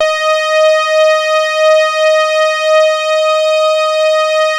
SAW, Synth lead; InVision Interactive; Keith Emerson; The Most Dangerous Synth and Organ

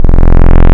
Saw To Square, wavetables; Animoog

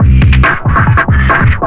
FILTER, Big Beat Drumloops

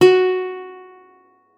GUITAR F#4; Xsample VOL-2

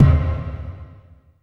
SPACE, PERCUSSION

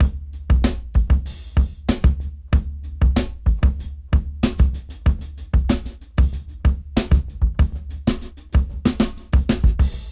Rap Dry 94 BPM; AMG - Keith LeBlanc Phat Butt Jams

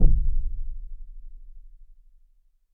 Tom A#4, Roland U220

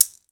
MEXICAN, Latin Shakers

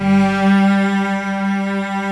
G3 CELL; AKAI S-Series CD-ROM Sound Library VOL-7; CELLO+VIOLIN

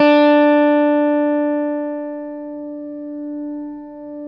STRATOCASTR

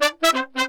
MERENGUE, World Grooves

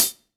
HH, Drum Mashines; EdgeSounds

